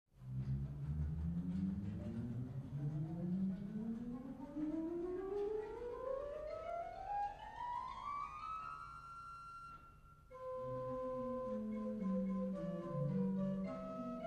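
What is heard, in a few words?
Gemshorn 8, orgel; Schultheis Schlimbach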